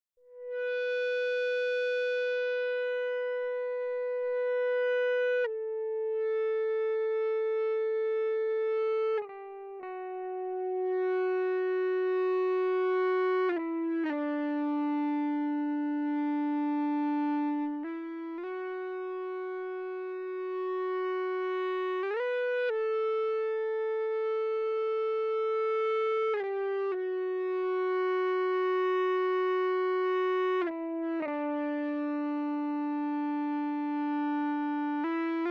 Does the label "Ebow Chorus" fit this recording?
Yes